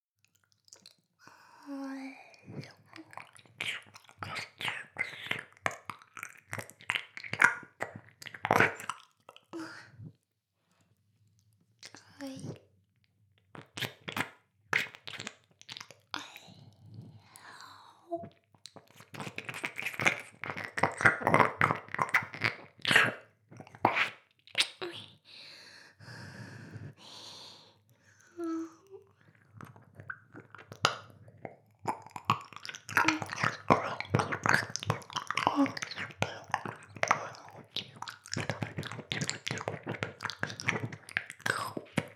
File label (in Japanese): 耳舐め; 全妹計画２; メスガキ妹